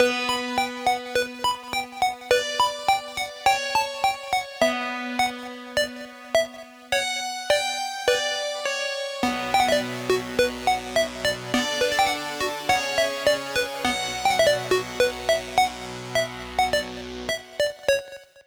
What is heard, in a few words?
City Layer loop mono; Doctor DOS City